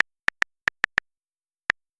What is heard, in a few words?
typing; TeamTalk v5.17 ubuntu22; client sounds